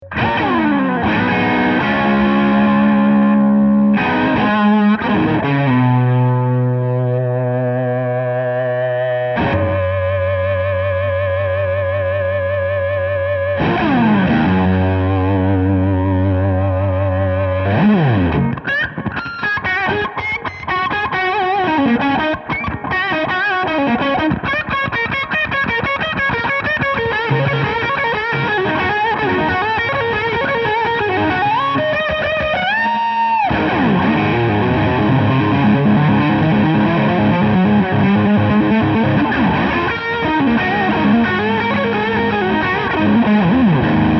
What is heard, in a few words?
fun with feedback